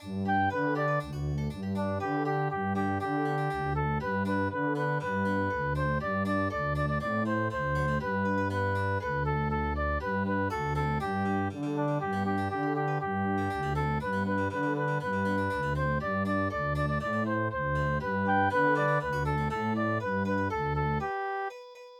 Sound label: orchestral